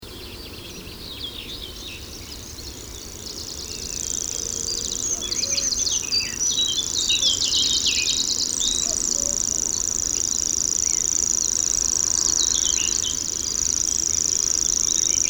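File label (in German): Feldschwirl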